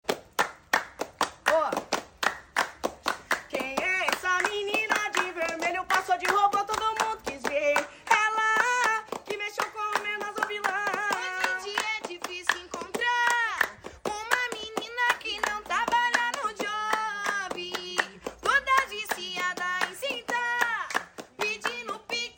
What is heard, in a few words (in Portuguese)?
FUNK DO BRASIL